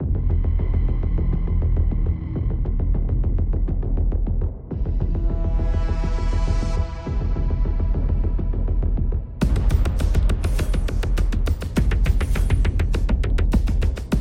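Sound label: Fear, Dark horror background music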